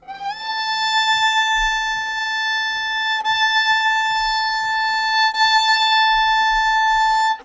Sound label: Violin Bit